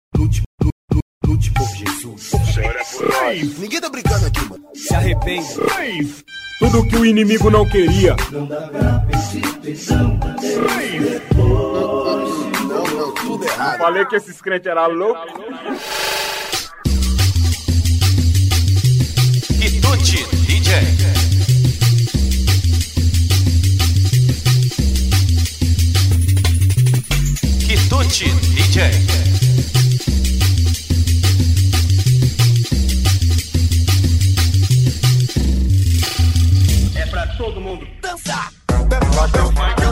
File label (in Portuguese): vinheta